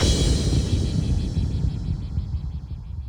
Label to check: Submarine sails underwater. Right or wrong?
Wrong